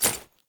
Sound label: Generic Footstep; Warframe; Uriel